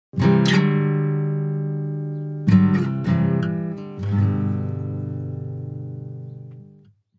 Aco Strum, Peaceful short strum on acoustic guitar.; Guitars; Musical